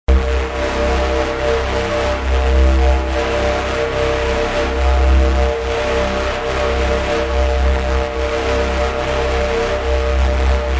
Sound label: giant bass tension; Basses